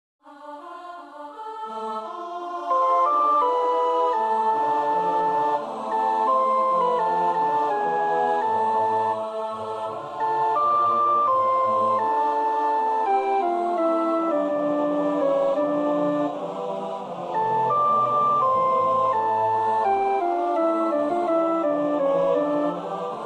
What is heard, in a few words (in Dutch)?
sopraan, koorpartij oefening; Sweelinck (Jan Pieterszoon); Pseaume 96